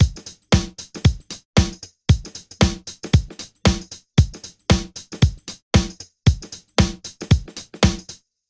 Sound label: drum dry